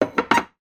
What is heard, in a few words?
household wine glass put down on shelf